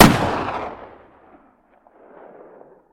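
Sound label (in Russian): шумный вылет боевой ракеты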